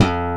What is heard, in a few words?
BASSES; Kid Nepro - Elite